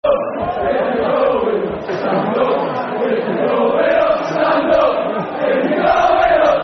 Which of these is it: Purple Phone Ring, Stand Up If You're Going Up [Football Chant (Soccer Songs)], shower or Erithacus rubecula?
Stand Up If You're Going Up [Football Chant (Soccer Songs)]